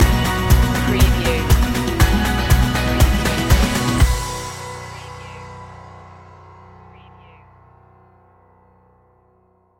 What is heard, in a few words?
together we go stinger